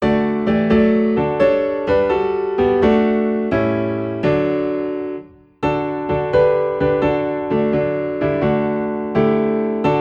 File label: Piano in D; Anywhere With Jesus